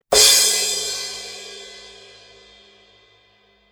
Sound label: thin crash 14 hit, cymbal; sabian